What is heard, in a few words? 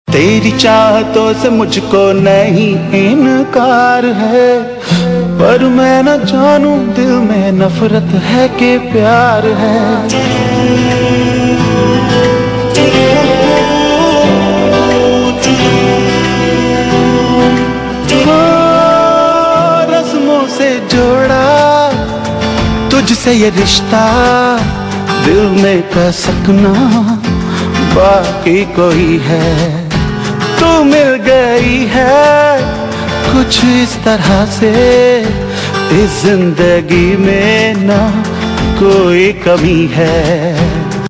Male; TV Serial Tone; Life OK